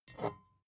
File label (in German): bottle vodka pick up slide, gastronomie